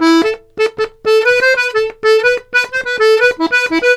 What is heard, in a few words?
RONDO, Accordions; USB Soundscan vol.40; AKAI